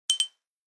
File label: Chat alert, Nokia 6220 classic